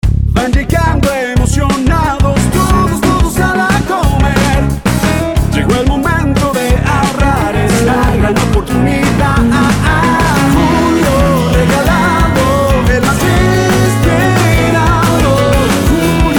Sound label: Singer